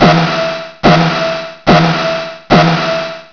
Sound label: car alarm